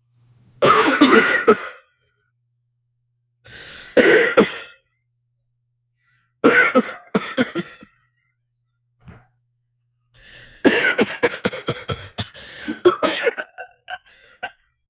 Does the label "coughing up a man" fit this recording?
Yes